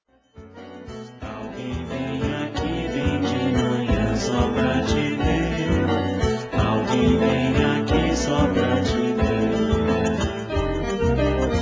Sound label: vocalise